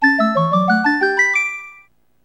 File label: Hula Hoop Round Start; wii fit